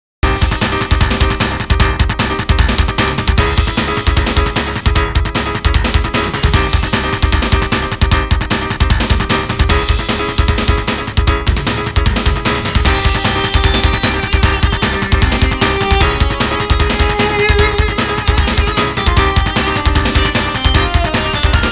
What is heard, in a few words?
Bonus Stage, pc engine; World Heroes 2; Ding! Ding! Here We Go (Bonus Stage)